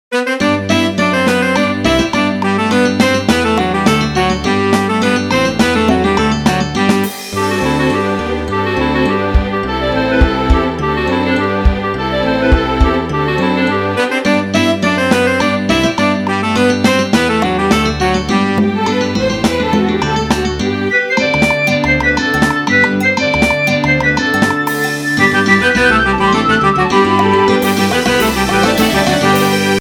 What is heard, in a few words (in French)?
Rigaudon